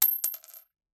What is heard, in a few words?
Coin Drop Concrete Floor